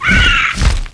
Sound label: bat hit; eqclassic